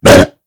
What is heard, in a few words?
pain, mummy